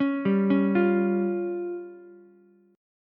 C sharp Major Flat 5th, Ukulele, C#Mb5 chord; C#Mb5 arpeggiated; JGuitar

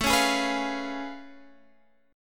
A Augmented Major 9th A down

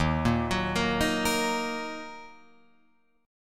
Eb Minor Eb down Guitar Open F; Ebm arpeggiated; JGuitar

